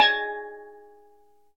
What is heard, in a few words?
STEEL DRUM